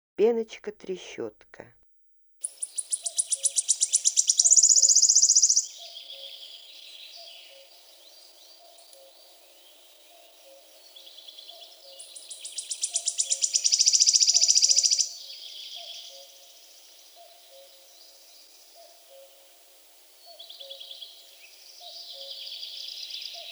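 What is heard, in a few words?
wood warbler voice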